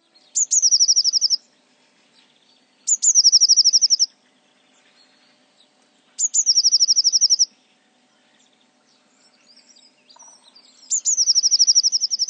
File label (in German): Vogelstimmenspiel Blaumeise